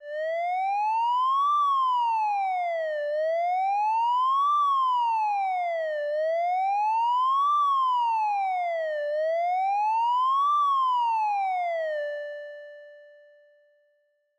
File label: Police Siren